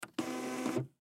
Label Printer; Industrial Feed Single Sheet; Office; Printers; Blastwave

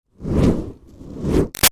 Fire Torch Whoosh Med Double; Flames; Big Room Sound

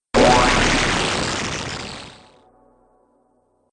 Disintegrated In Teleport, Sci Fi Music

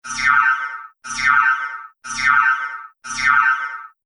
Laser Loop Time, looping sound effect; Laser Sound Sound Effects

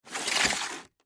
Box Slide Cement; Package; foley; Slides; Objects; Blastwave

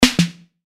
Chime Percussion; Multimedia Web Interactive; Blastwave; Technology

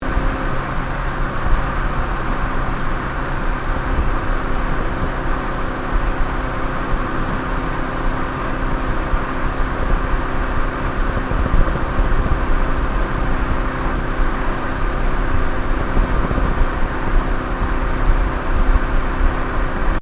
Car Ford Focus Cruise Exhaust; Ford Focus hire car; Cars; Vehicles; SoundEffects-Foundation1

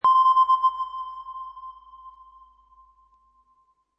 Sonar, Sci-fi sonar or radar ping; Sound Design

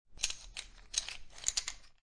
Poker Chips Handling And Moving Chips; Gambling; Casinos

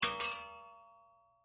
Bullet Shell; Bullet Shell 9mm Drop Metal Catwalk; Drops (Sound Effects:Objects); Blastwave; Warfare

